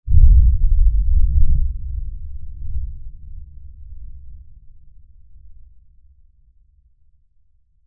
Impact Lfe Blast Rumble; Blasts (Sound Effects:Impacts); Blastwave

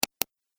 Pc Mouse Click And Release

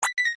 UI Deny, User interface feedback sounds; Ui Set D; Computers; Electronics

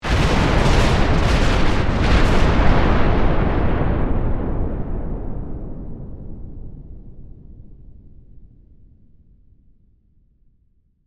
Explosion Massive, Warfare, Explosion, Massive; Bomb Sound Effects; Explosions

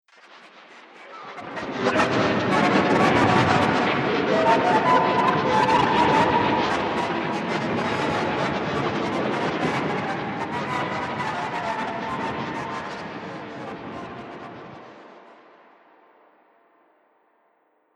Strange Experiment, scary soundscape; Doctor Horror - Strange Experiment; Horror Sound Effect

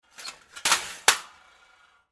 Crash Metal Drop Junk Scrap; Crashes; Sound Effects:Objects; Blastwave

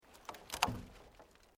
Wood Logs Movement Minor; Material components for constructing more complex sounds; Materials

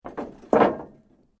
Metal Sheet Move; Materials

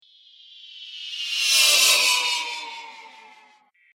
Falling Space Zoom, whoosh; Whoosh Sound Effects; sound design

